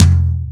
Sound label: tom, drums; virtual drum sounds; custom drums